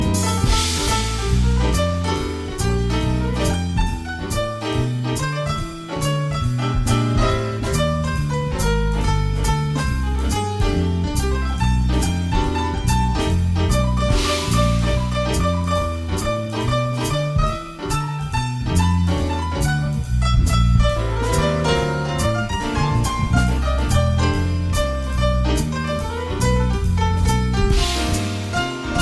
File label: Jazzy Song